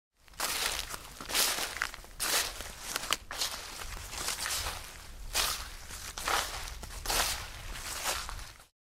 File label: footsteps fading